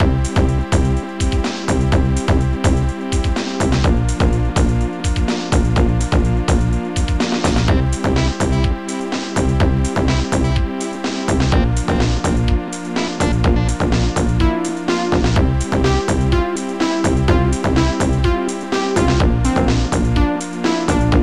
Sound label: silent rain